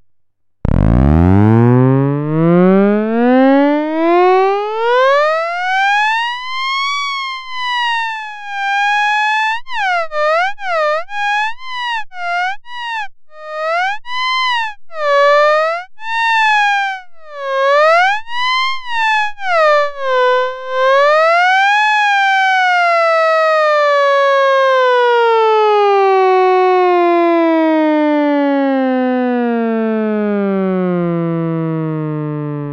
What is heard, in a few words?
impulse